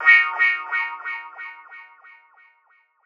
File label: Guitar Wah D#; Guitar & Plucked